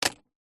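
vehicles car keys set down on plastic centre console interior car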